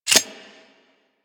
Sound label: Heavy Shotgun Reload; Weapons; Warframe; Tenno